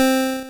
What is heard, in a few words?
Beep; Bitkits; Blip & Blop